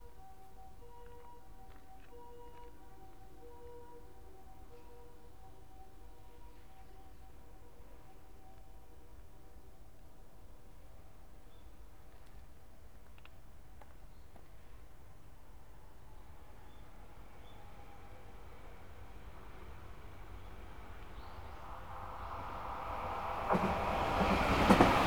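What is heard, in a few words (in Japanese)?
清瀬カーブ。 下り電車通過。 続けて上り電車通過。; H1 XLR 外部マイク SONY ECM-989 MSマイク指向性120°＋Rycote BBG Windjammer; 録音したファイルを、本体でノーマライズしました。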